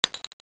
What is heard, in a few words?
shell, weapons